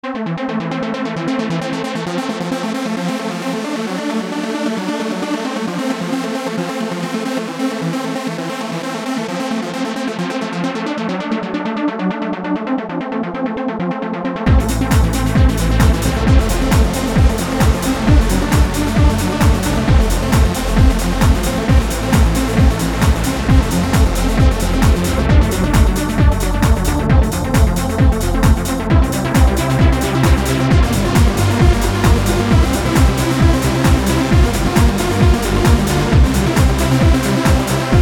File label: Super Lead